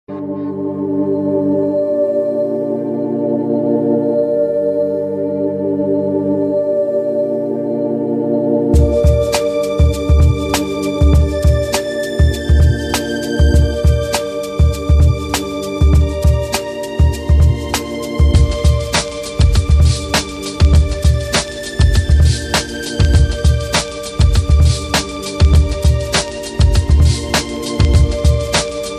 Ambient Chillout Ringtones

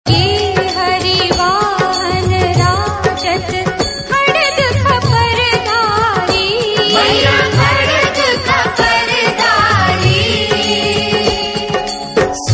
Ringtone, Navratri Special; Jai Ambe Gauri Kehari Wahan Rajat

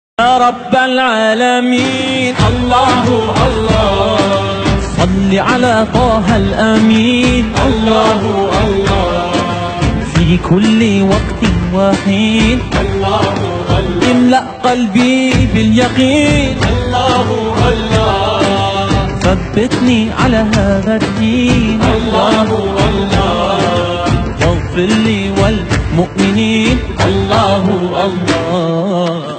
Hasbi Rabbi Islamic Ringtone

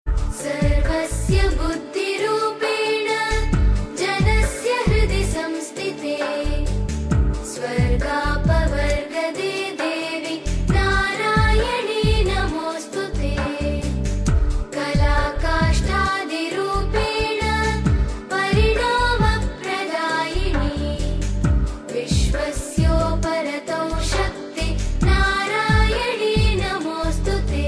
Maa Durga Mantra Ringtone; Navratri Special

